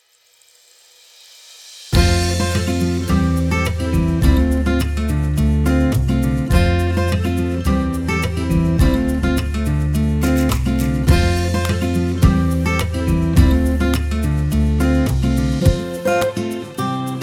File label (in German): Akustik Gitarre; Ströck Hook